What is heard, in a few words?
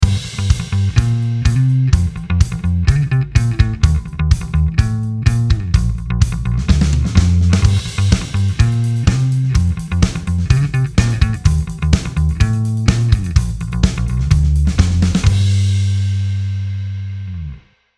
modern, bass amp designer; logic pro